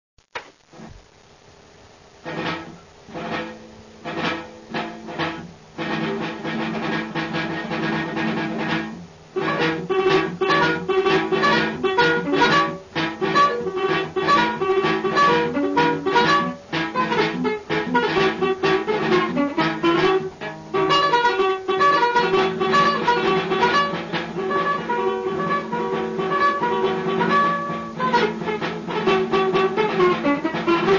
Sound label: march